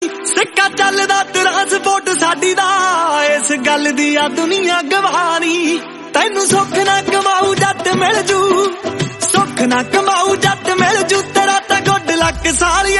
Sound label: Punjabi Song